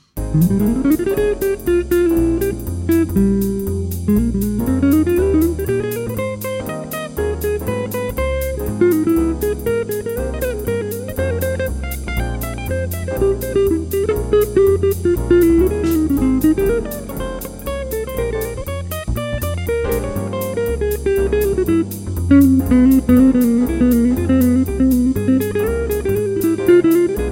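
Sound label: 240bpm solo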